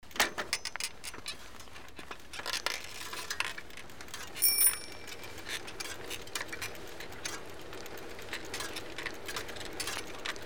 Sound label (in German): Fahrrad